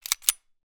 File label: gun 1911; weapon; still north media